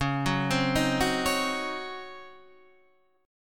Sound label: Db Major 7th Suspended 2nd Suspended 4th Db Guitar Open D